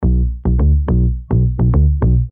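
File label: muted guitar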